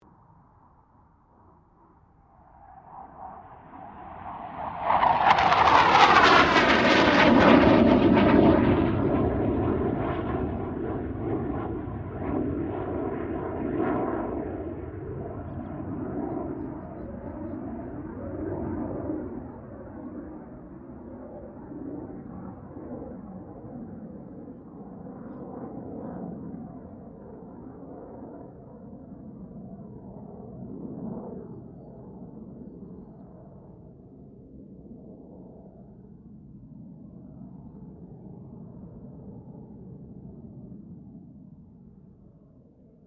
AIRCRAFT CA-27 SABRE PASS; CA-27/F-86 Sabre; Airplanes; Vehicles

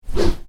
Swish: Short, Slow, Whoosh; Whooshes (Sound Effects:Sound Design); Stuart Duffield

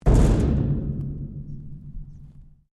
Strike Metal Hollow Tank Soft; Materials

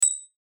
Chime Ping Bright Metallic; Production Element Imaging Element Accent Transition; Chimes; Multimedia; Blastwave

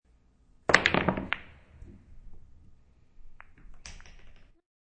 Pool Break

